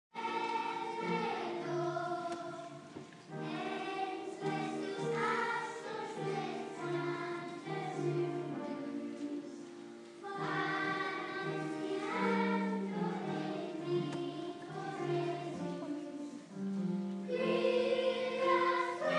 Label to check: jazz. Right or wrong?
Wrong